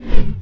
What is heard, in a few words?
wep lance; massassi